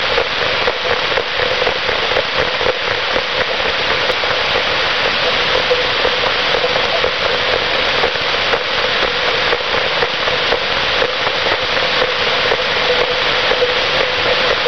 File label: Watch 3 Feet Through Wall